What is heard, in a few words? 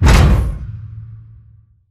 pod door ext verb open stop; Titanfall 2